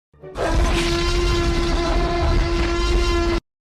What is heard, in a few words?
T rex roar